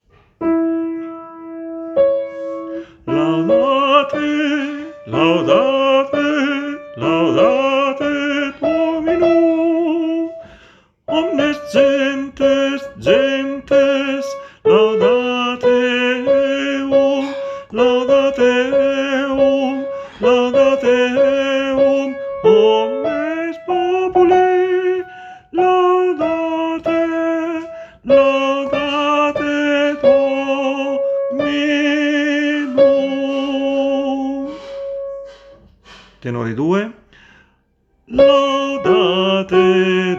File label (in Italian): Tenore; Laudate